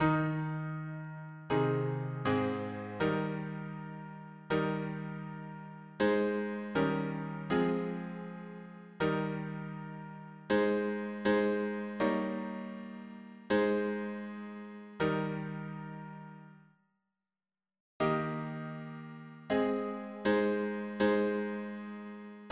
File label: harmony piano